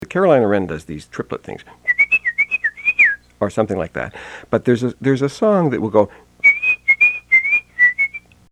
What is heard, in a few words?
carolina wren